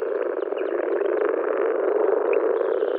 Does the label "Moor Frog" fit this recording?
Yes